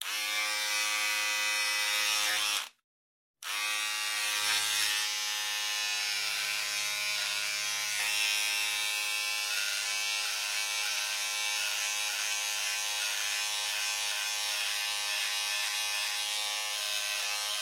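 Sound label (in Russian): звуки машинки для стрижки волос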